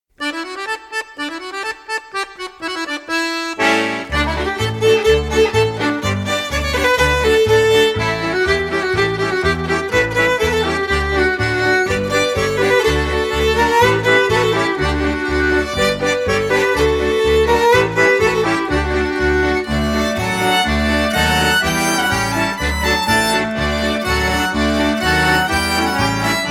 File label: recorder